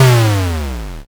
explosion m

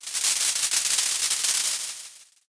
ovation

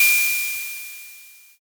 ride cymbal

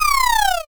horse neigh

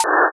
wallet

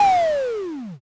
power off

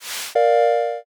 world move

